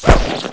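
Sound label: Rubber Duck Head Off; 1997 MMX LAN PACK (win 95); Army Men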